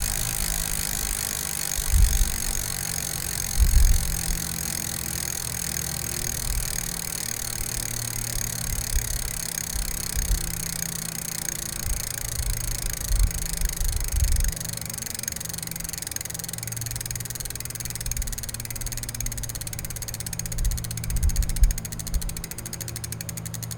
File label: Bike; fieldrecordings